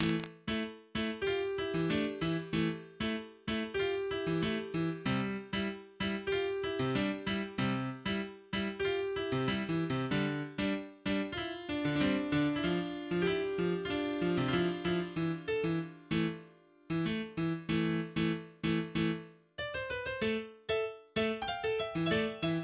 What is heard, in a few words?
piano; Genshin Impact